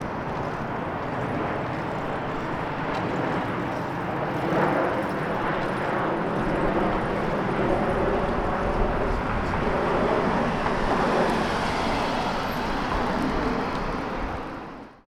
Bicyle Plane Car, ambient; Menil Drawing Institute; HOUSTON

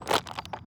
Water Bottle Crumple, percussion; HOUSTON; Fifth Ward

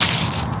Tree Branch Perc, percussion; Lindale; HOUSTON

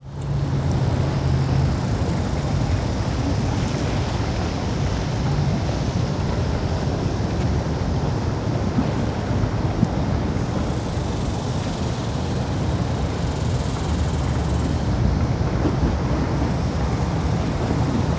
Allens Landing Ambient; First Ward; HOUSTON

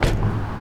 Sewer Cover, percussion; Third Ward; Emancipation Park; Houston

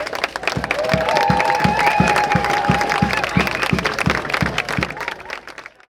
Downtown Houston Discovery Green Applause

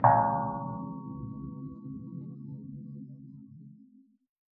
Allens Landing Steel Bench, percussion; First Ward; HOUSTON

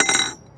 Moody Park Chimes Perc; Houston; percussion

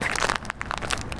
Third Ward Emancipation Park Acorns, percussion; Houston

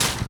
Tree Branch Snare; Lindale; Houston

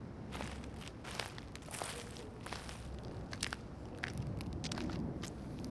Footsteps; Old Sixth Ward; Houston

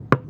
Transit Center Bucket Perc; Houston; Northline

